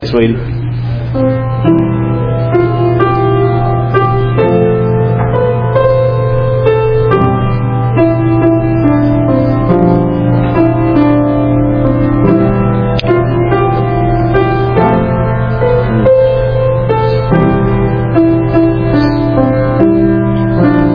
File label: Children Playing Piano